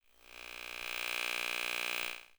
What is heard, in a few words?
Electronic Movement